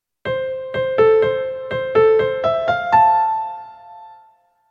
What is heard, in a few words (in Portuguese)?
polifonia